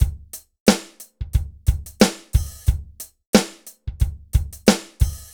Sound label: dry Loop BPM 90 Dry, AKAI S6000